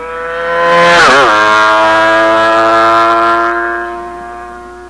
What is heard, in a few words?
500 fly, Kwacker